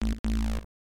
Wrong; pc-9801

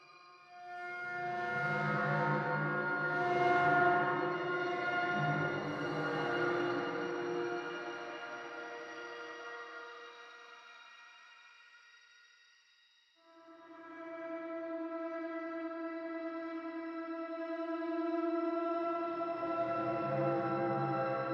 Ambience Bare Endo; Five Nights at Freddy's; FNAF Special Delivery

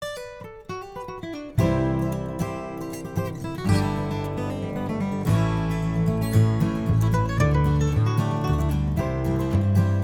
Radio Music; Jurassic World Evolution 2; PS4, PS5, Windows, Xbox One, Xbox Series X/S

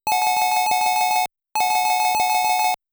Hurry-up Sound

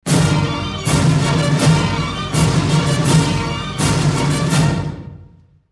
Legion march; Pride of Nations

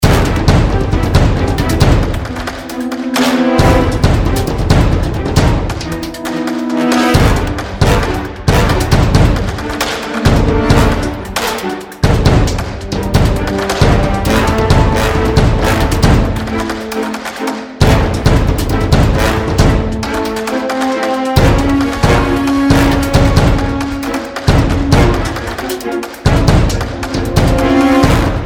Boss Level; Stage-1-Boss Level 28; Krut The Mythic Wings; Xbox Series X/S

